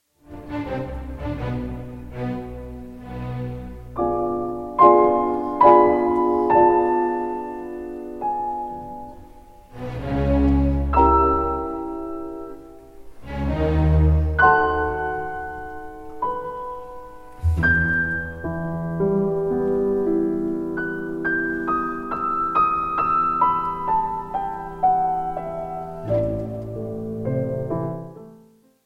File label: piano concerto no 4 in g 2nd mvt andante con moto; ludwig van beethoven